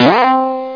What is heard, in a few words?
spring; monty